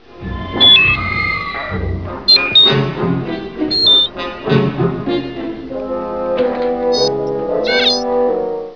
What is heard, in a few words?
beep whist; star wars